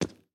refined concrete, walking; factorio 2.0.55

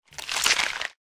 medium gore; factorio 2.0.55

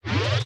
exoskeleton inventory pickup; factorio 2.0.55

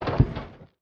pumpjack inventory move; factorio 2.0.55

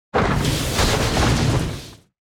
spidertron debris; factorio 2.0.55

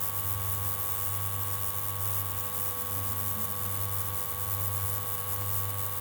accumulator idle; factorio 2.0.55

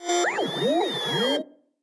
flying robot; factorio 2.0.55

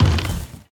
car wood impact; factorio 2.0.55

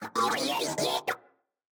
construction robot; factorio 2.0.55

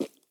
dirt, walking; factorio 2.0.55

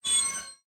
train brake screech; factorio 2.0.55

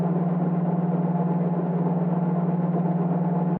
fast transport belt; factorio 2.0.55

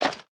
weapon large inventory pickup; factorio 2.0.55

